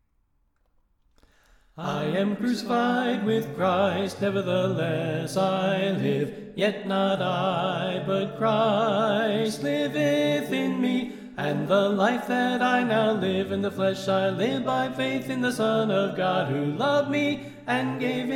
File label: Soprano Left